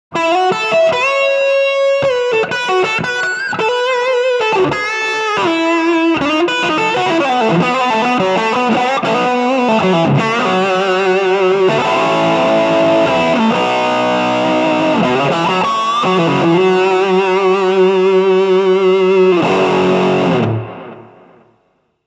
Crush Lead Delay In FX Loop

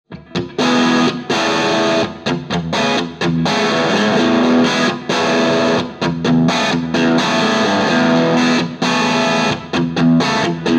Crush Crunch Reverb

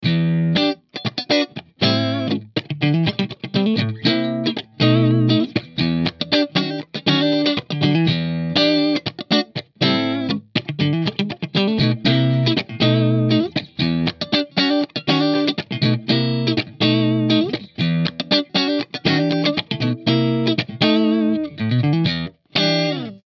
Channel2 Ibanez S470

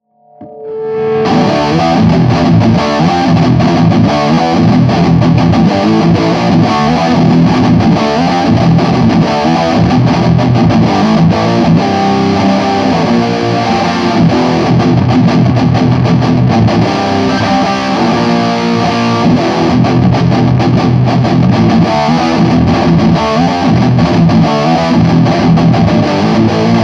Hardcore; Bax Bangeetar

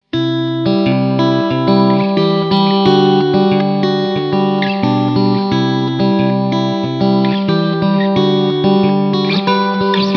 Super Crush Clean

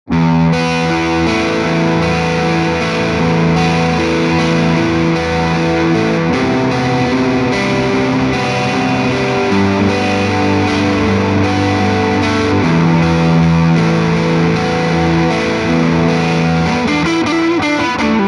Les Paul Superwide Fuzz

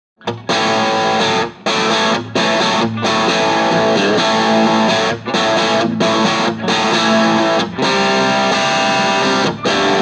Crush Cranked Crunch

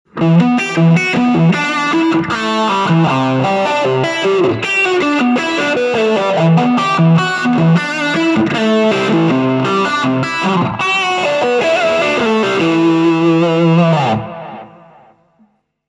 Crush 35RT Phase Lead Delay In FX Loop

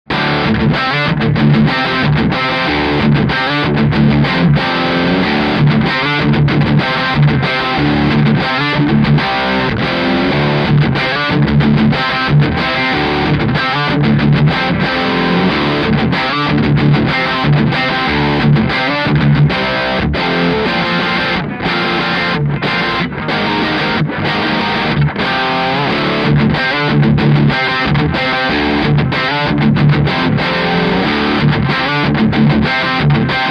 Terror Heavy rhythm Dirty channel Les Paul

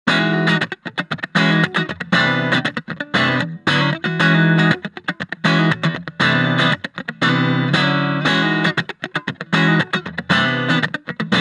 Tele Clean Funk

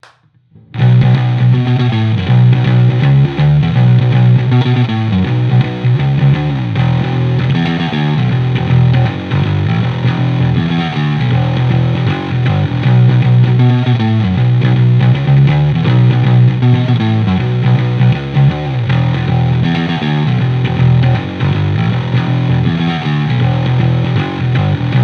Crush 50 Bass Fuzz

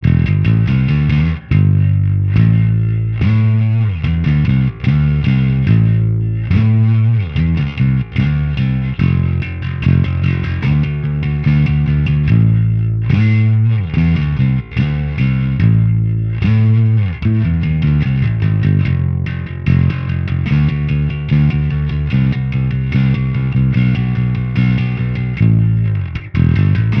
Bass Mic; Bass Butler

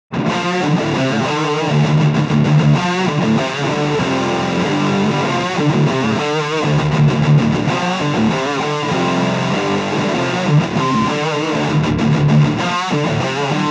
Crush Heavy Riff Double Track

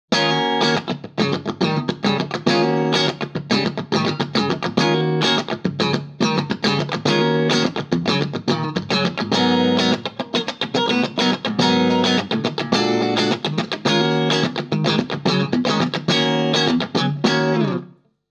Crush Clean Funky

